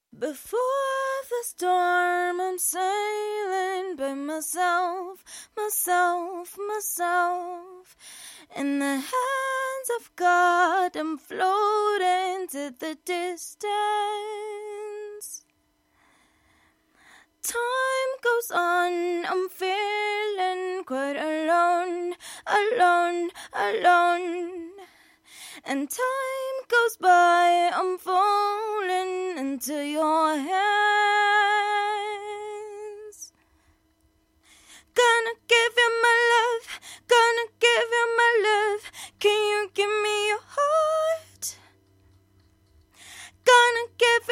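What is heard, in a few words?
pop rnb 120 C sharp M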